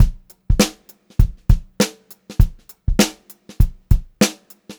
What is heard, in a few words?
BEAT, Funk-Groove Drumloops; USB Soundscan vol.38; AKAI